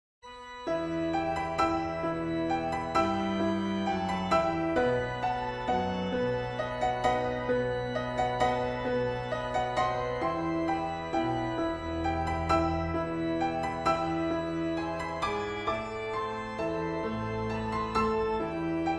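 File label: piano descant playback; O Sing To The Lord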